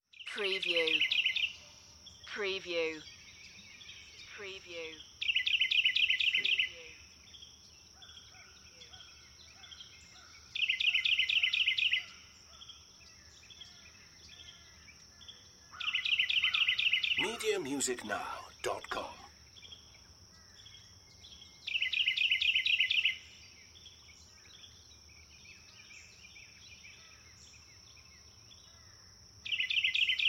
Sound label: Forest Birds Chirp